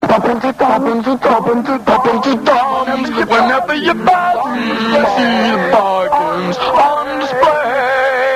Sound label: pop toms; rendition of a DIY store's catchline 'Pop Into Toms'